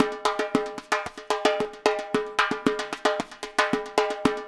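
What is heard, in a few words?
loop, tambourines; InVision Interactive; Percussion Slam; WORLD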